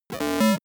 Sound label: broken computer high short